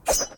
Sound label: Disk Throw